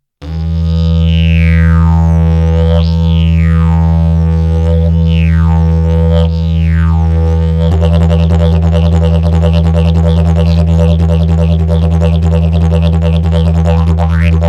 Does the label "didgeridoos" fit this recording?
Yes